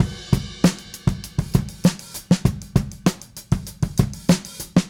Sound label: Smooth Groove Drums Crash, 70s Analog Funk; Sonic Foundry (Sony Creative Software); What it Is!